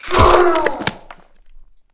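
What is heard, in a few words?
Giant Buff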